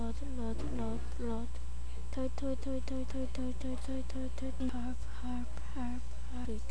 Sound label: toy